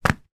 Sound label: fabric wallet drop on floor; foley